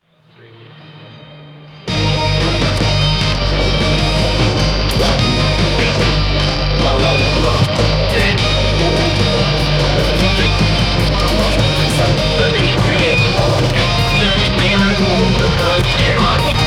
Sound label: investigative modern, modern